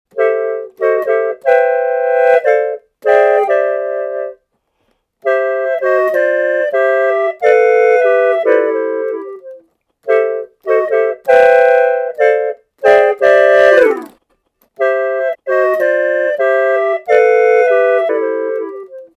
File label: claviola